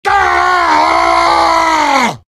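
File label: pain critical death; vo; heavy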